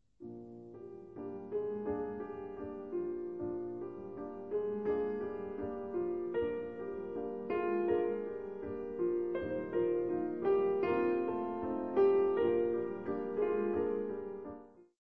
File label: piano, 2 pianos